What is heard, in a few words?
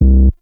Hip Hop Pack; Warframe; Bard; Bard Instrument Packs